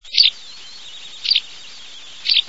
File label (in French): bergeronette grise; Sons Oiseaux